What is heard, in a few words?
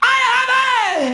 "are you happy" SUNG